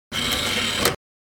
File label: DVD player tray open or close